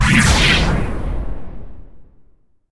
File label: teleport